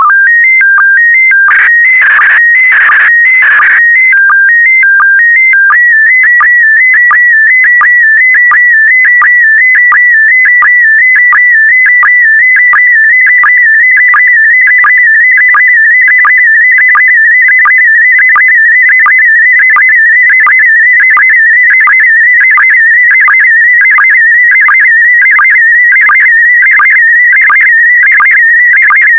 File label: SSTV, PD90